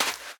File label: sand run, step; blocks; Minecraft; Remastered